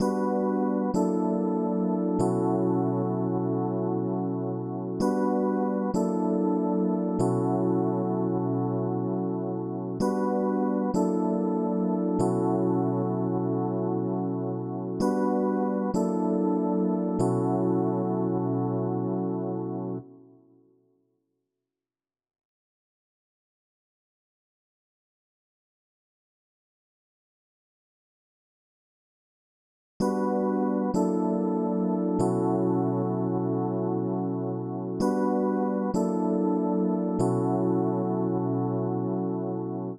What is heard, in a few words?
96 BPM B Min Key, West Coast; Cymatics; GHOST (Beta Pack); Forever